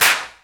original clap single shot c key, hand clap tuned to the C note; single hits one shots